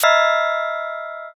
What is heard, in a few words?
sting